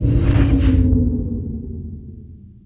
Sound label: weapon power decrease